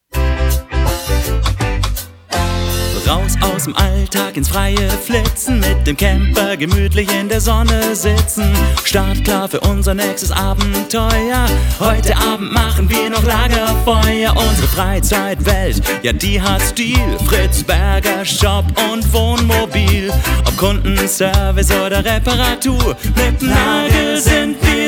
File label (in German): Reggae Songschnipsel; Mysoundbranding